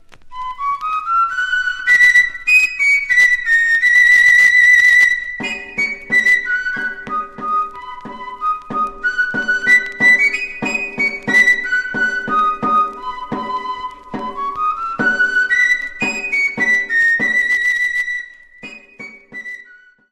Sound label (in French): Minuet - Pays-Basque; Minuet Beri